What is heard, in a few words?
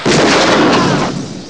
EXPLOS